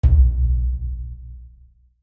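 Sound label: spin button base; rome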